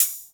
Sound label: Hihat Open Chirp Hat